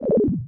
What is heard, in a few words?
holo disappear; seeding-planets